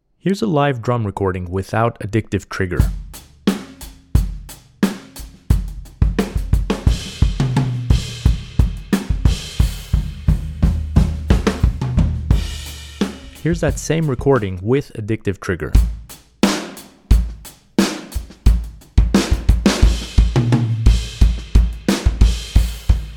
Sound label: drums only